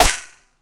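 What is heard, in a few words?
tight aggressive drill snare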